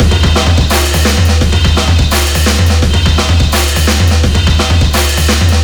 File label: drums, horde; music; szf; zombat